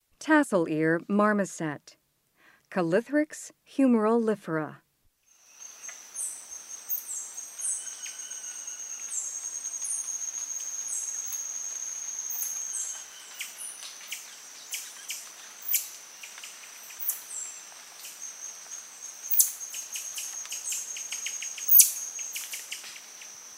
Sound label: Tassel-ear marmoset; S. America; Rainforest